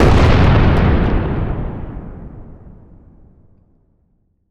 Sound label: Explosion Large Deep; Fire and Explosions